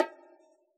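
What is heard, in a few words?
bongo high; AnlgKit1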